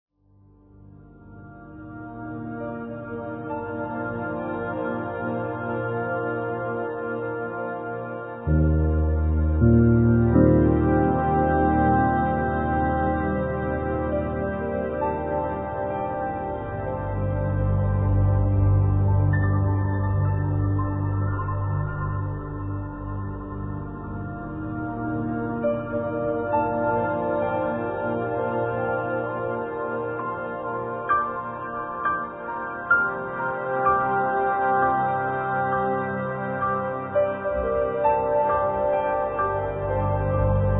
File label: piano textures